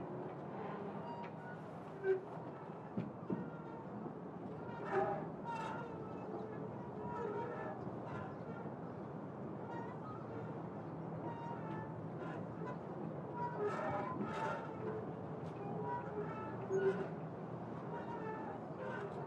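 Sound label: ambience wind creaks; midnight guns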